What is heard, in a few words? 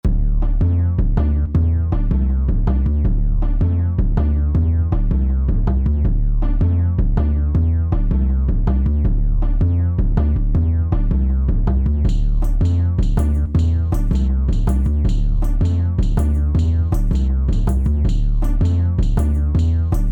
Forest 110BPM Explore; Dragons Destiny OST